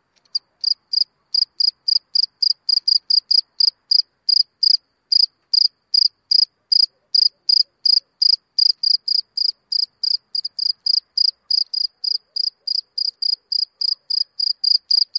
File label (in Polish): Świerszcz polny; odglosy dochodzace z laki